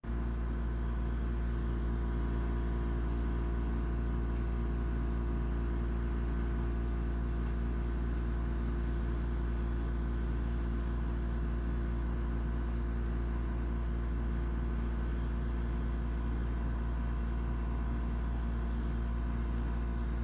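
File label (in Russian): шум кондиционера; barra du turvo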